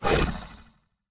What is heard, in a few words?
Large Creature Short, Monsters